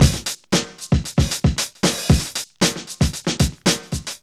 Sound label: HEAVY 115, Funky Elements loops; Zero-G